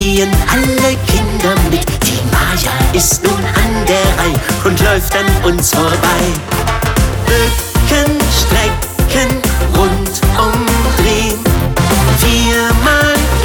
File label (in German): singmaus